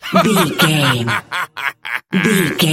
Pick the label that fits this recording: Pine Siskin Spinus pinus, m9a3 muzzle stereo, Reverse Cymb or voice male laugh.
voice male laugh